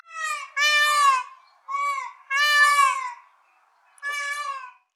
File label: peacock sounds